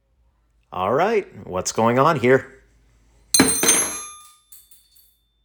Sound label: sample sound